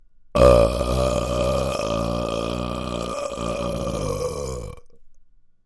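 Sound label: long burp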